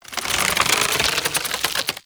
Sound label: Nunchuck Finisher Tension; Warframe; Infested